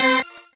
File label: normal hit normal